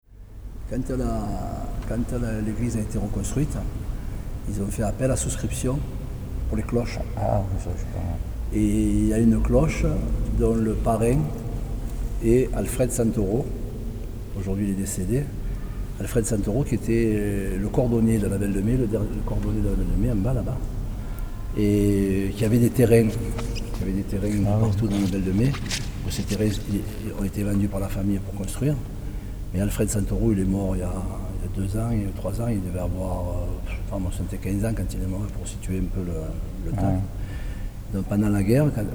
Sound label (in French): cloches